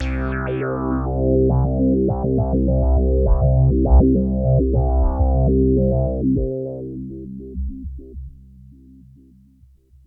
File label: Big Synth Basses